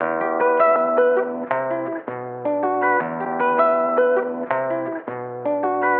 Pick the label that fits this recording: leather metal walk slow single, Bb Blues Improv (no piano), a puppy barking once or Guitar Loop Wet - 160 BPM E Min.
Guitar Loop Wet - 160 BPM E Min